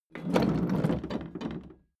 roll smooth

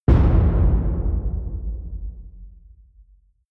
wood hit large table

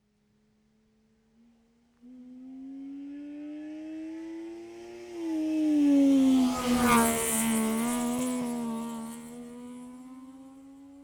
car saab 92 exterior pass left to right

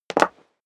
step high heels wood parquet jump; schritte

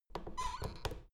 door plastic handle pull up creak soft

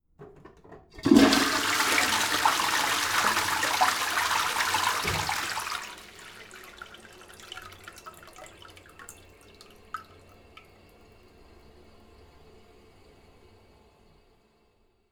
toilet flush long, badezimmer

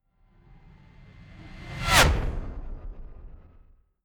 fly by hover car hum high

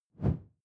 whoosh simple slash big low; swooshes-swishes

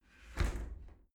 shower slide door close hit soft

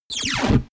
jammed laser beam; soundpool

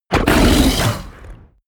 fishman snort aggressive; kreaturen

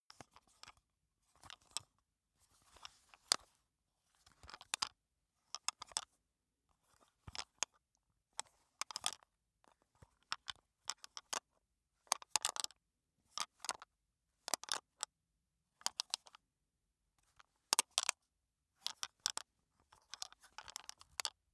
makeup box handling; haus-garten; badezimmer

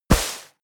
step walk grass; schritte

